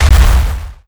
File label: Wall Hit; Nicktoons Nitro; arcade